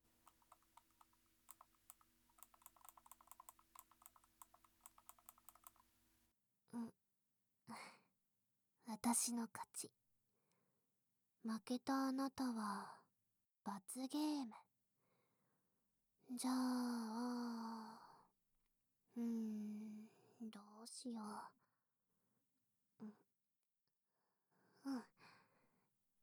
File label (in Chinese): 耳语舔耳朵; ASMR